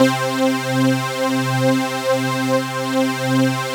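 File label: C-3 PULSE WIDTH, PWM; AKAI S-Series CD-ROM Sound Library VOL-7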